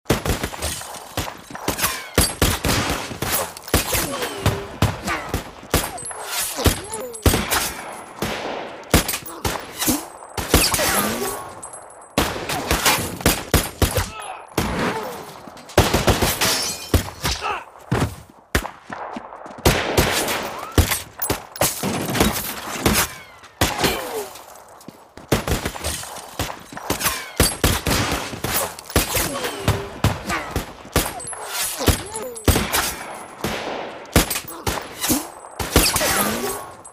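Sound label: shootout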